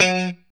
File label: POP F#3, STRAT guitar; Spectrasonics; Hans Zimmer Guitars VOL-1; JC-STRAT POP